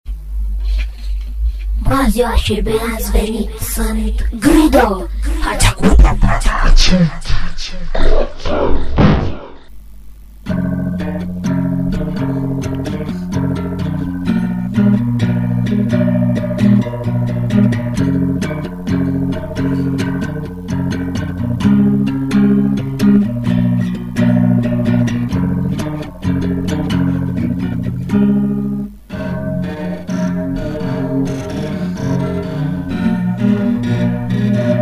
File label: grido blues